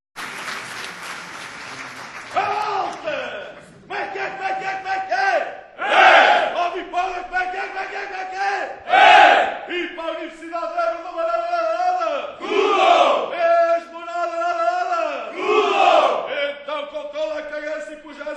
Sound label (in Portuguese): Grito Academico